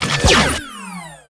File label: WAR ATTACK